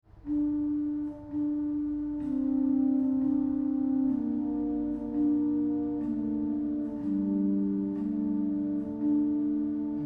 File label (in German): abendglocken, organist; Andreas Willscher; Evening Bells; Inventions (2006–)